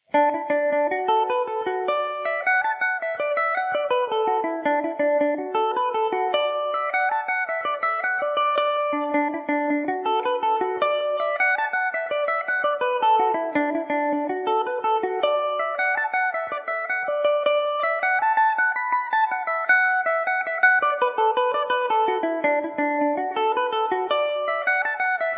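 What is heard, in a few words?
D major, Irish mandolin; kilglass lakes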